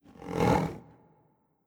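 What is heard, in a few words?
Huntress Run Tiger voice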